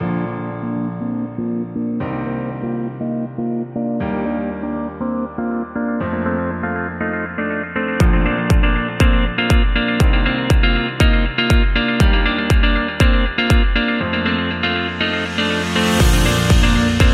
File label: Motivational Corporate; explainer